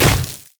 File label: frost scorched earth; LoL; shyvana